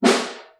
SN1 Far, Snare; Ableton Foundation FreePacks; Snare Designer

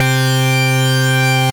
Lead, synth lead; Wavetable; Proton; Ableton; Factory Packs; Synth Essentials

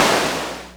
Clapbox Noise; Ableton Foundation FreePacks

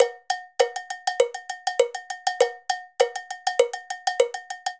Salsa Cowbell 200bpm, Latin Percussion; Ableton; Factory Packs

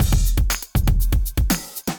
Acoustified Kit, Drums; Ableton; Bomblastic; Acoustified Kits

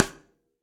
Snare Sidestick Session Dry; Drum Hits; Session Drums Studio; Standard Hits

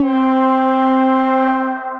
Bassoon Augmented, Tranquil Winds; Ableton; Mood Reel

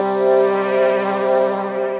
Broken Keys; Instruments; Floor Boards of Nostalgia; Ableton; Factory Packs; Mood Reel

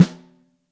SN2 Top, Snare; Ableton Foundation FreePacks; Snare Designer

